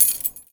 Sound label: Foley